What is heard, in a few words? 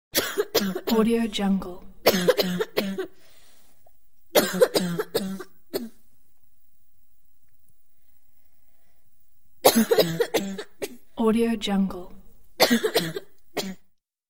cough